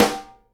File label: snare GLASS, Live Snares